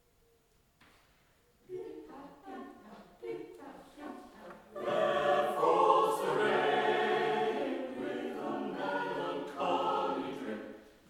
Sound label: Drops of rain, choir